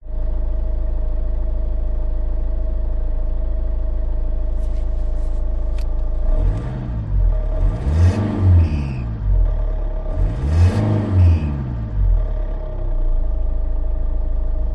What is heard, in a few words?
car suv holden captiva engine idle rev off internal; transport